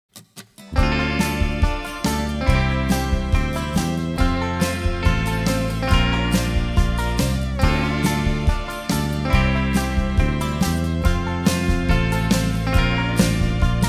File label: COUNTRY FEEL GOOD